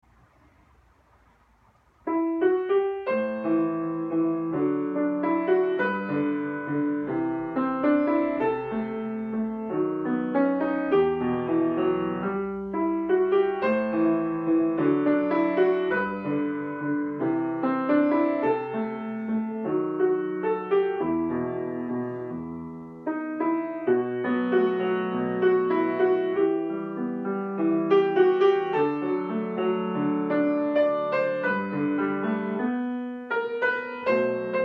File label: Easy Piano